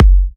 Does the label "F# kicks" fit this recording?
Yes